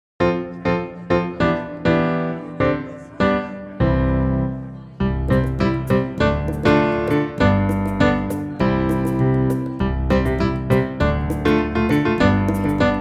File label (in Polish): wersja instrumentalna; EduMuzArte